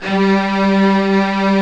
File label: ARCO STRINGS; OMI - Universe of Sounds